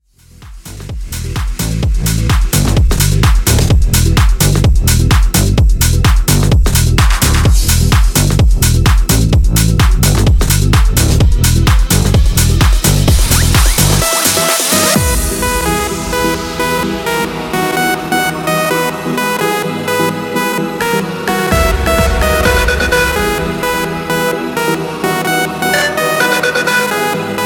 Mashup Festival 128Bpm

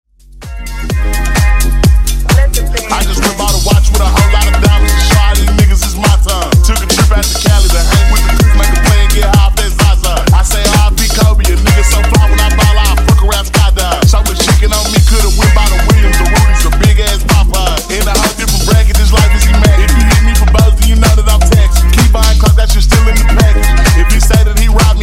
Funk Nudisco Bootleg 128Bpm

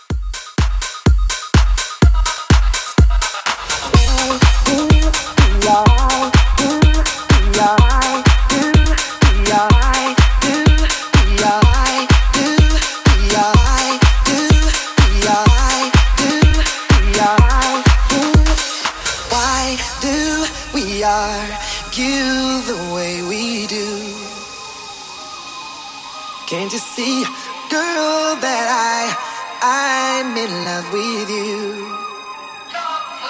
125Bpm Clean

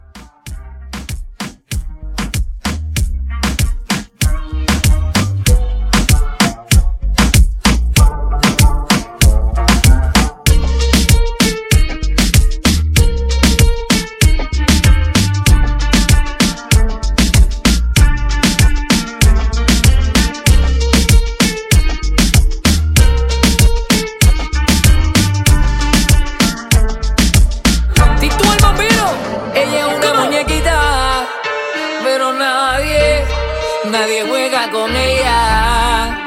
Latin Reggaeton Club

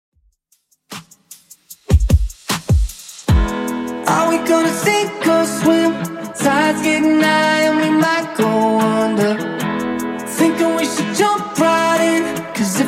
Top40 Xtend 76bpm

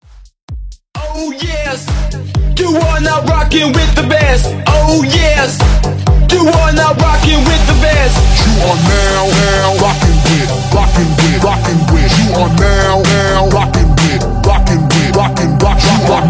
Bootleg 129 BPM

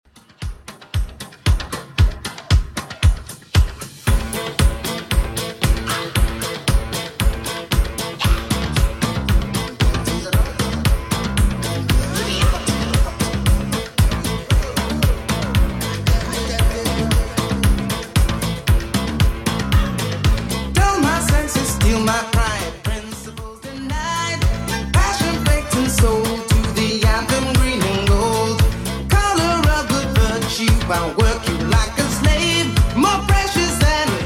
80s Redrum, 115bpm

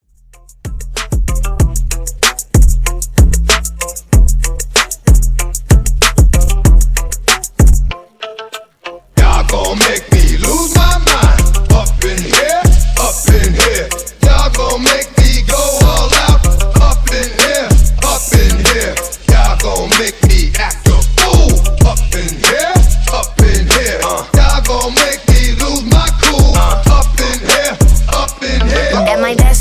Hype; Redrum

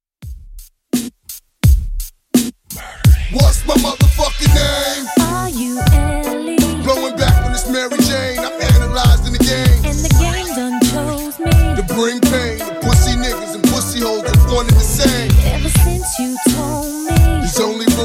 Throwback Rnb 85bpm

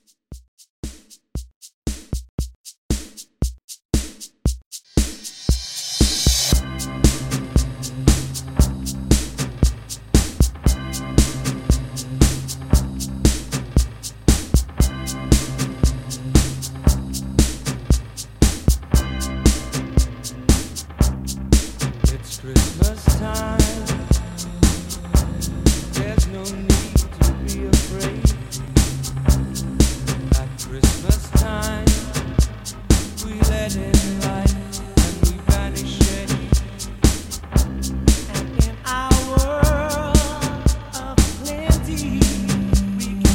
Christmas 80s Pop Re Drum 116

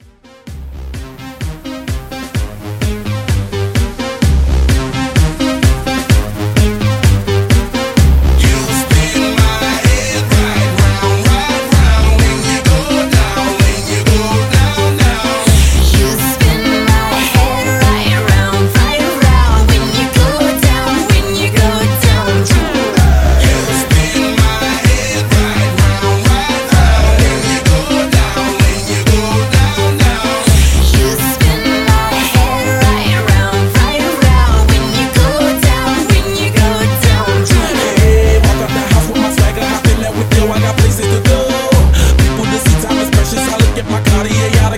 Hybrid Mashup 128

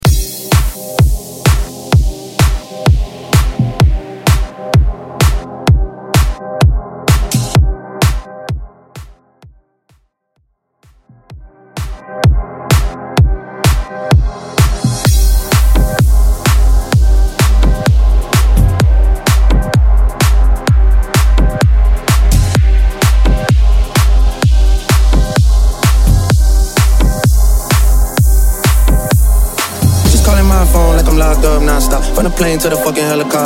Bass House; Mainstream VIP

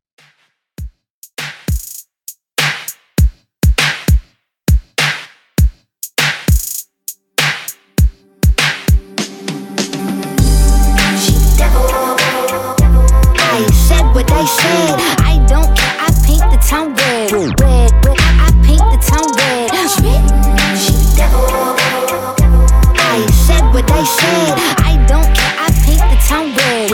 Edits

